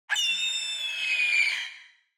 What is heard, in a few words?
falcon; soundscrate